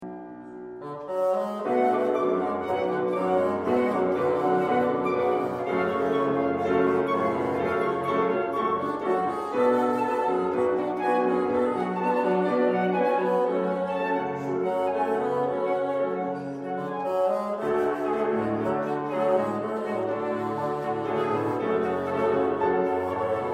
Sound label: Doppler fl bn pn